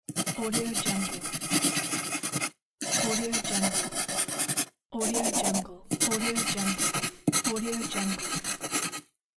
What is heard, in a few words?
pencil writing